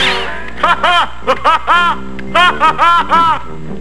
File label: hahahaha